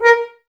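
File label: Brass French Horns A#; Sample CDs; Miroslav Vitous; Woodwind Brass Ensemble; F.Horns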